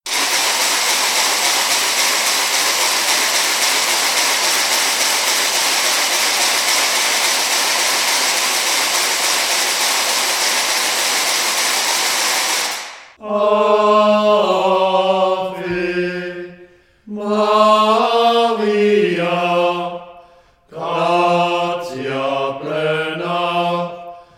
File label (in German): Ave Maria gratia plena mit Raetschen